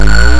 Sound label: paddle widening stereo